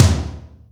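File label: Rock tom, Boss DR660, ht; drum machine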